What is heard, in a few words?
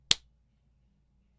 hand high five; anemoi